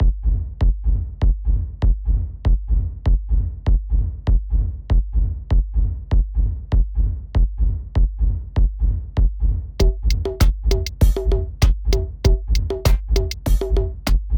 RAW Kick

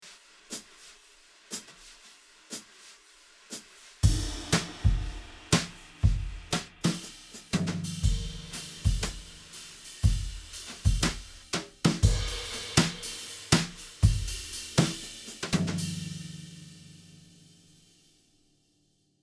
Logic Pro X Pop Brush Drummer Besen; Tyrell

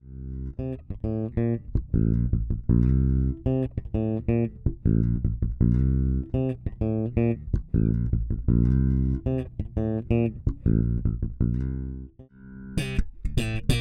slap

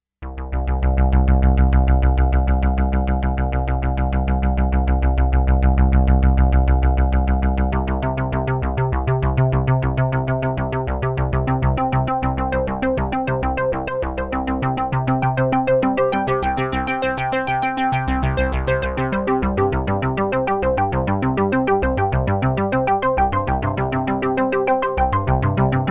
MatrixBrute Ausgang Stereo Out

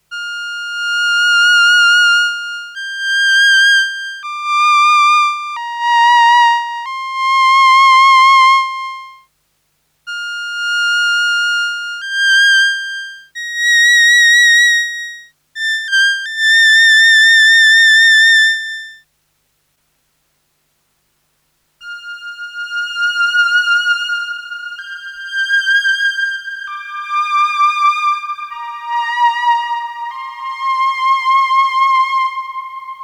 SY 1 Violin with after touch dry and wet

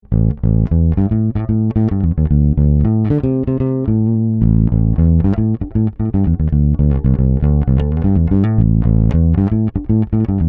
e bass fast even; Dave Hill Designs Europa 1

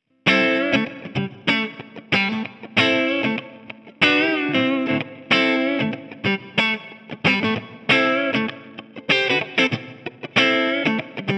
Valeton GP 200LT Modern Clean

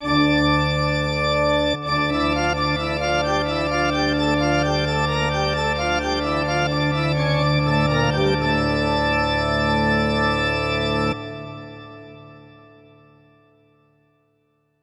Korg SV 2 Church Organ

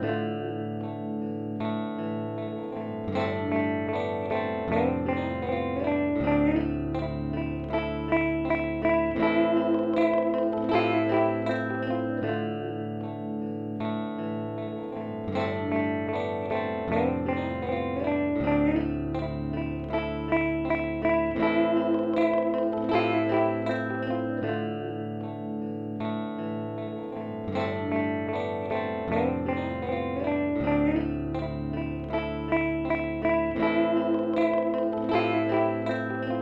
Mooer D7 Looper

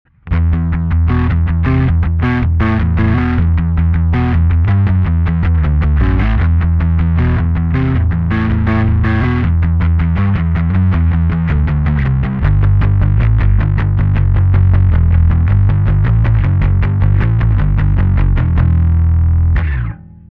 lo mix boosts bass heavy

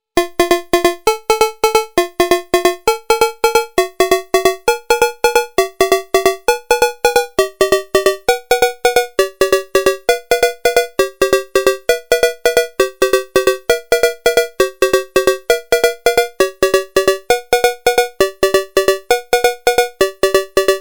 cowbell

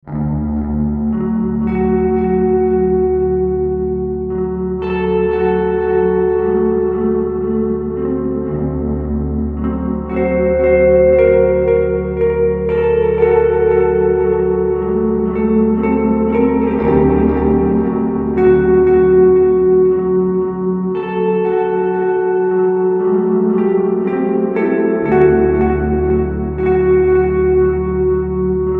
Seymour Duncan Dark Sun

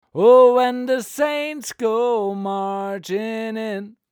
DPA 2028 12cm Vocals

